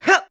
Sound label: Throw; Link